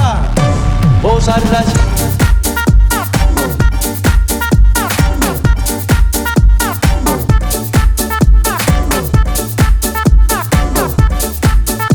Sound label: remix dance